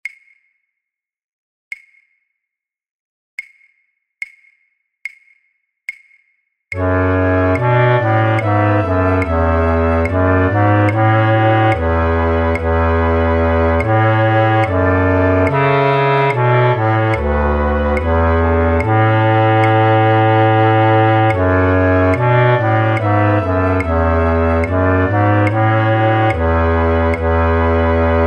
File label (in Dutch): Matthaus Passion fluitorkest Contrabasfluit; voor oefentracks